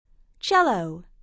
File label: cello